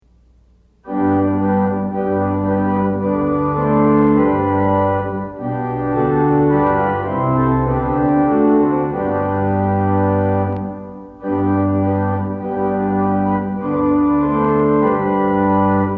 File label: Organ Music for Worship Services; Holy, Holy, Holy Lord!; Sixteen Liturgy Hymns